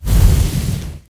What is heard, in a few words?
Fire Ball Hit; god awe full clicker 2018 gamerip